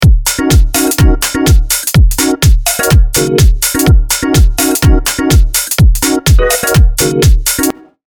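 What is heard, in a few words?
with Drums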